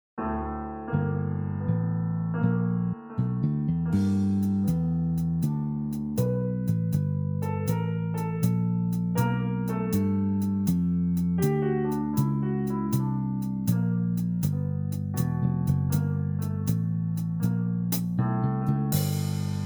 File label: Blues